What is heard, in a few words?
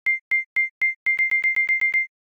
Camera Timer 2sec; Samsung Galaxy S4 (GT-I9500)